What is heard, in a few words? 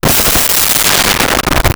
Machine Gun Bursts; Weapons & Explosions